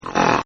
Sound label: SNORT, Snort N Spit; Spitting; Human; Partners In Rhyme